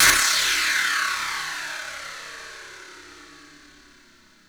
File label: FX Downlifter